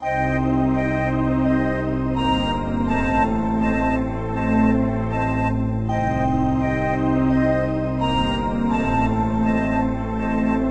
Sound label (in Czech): Melancholický; Domestic Violence Game Over